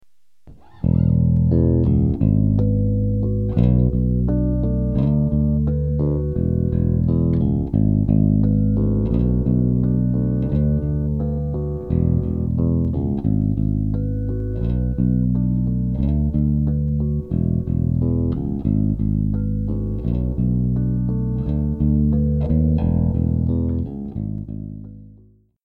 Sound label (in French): harmoniques